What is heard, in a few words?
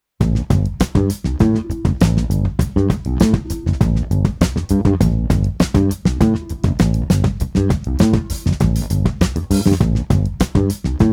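Street Guitar Bass Funky Groove no Drums, 100 bpm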